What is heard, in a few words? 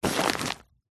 bag sports small pick up; zapsplat